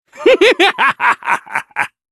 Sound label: laugh; heathcliff